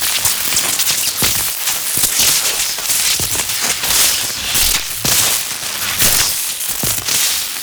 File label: Speaker Charge Up Spark Loop; Defense